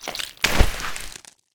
harvest use success; STALKER; IWP; item usage

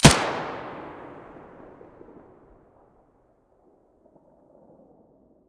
muzzle stereo

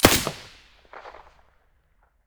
sa58 silencer stereo

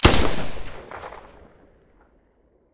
silencer galil stereo, weapons

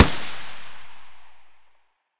ak74u muzzle mono distant, weapons; STALKER; IWP

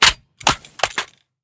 riffle drop grass; STALKER; IWP

